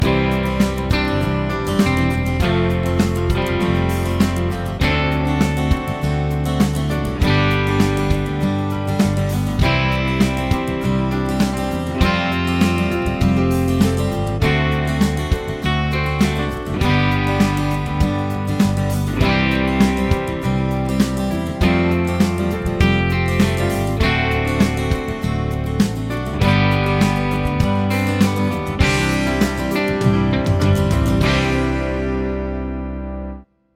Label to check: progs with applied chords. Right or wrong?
Right